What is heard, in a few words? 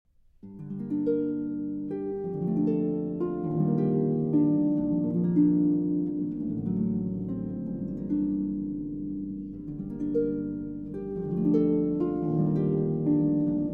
Sarabanda; Rota Sarabanda e Toccata